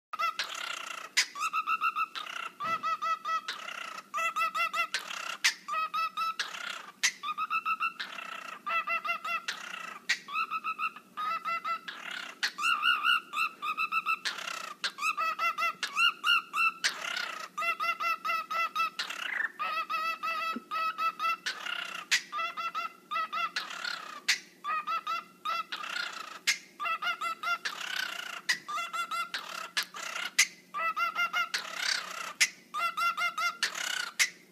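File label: cockatiel sings dubstep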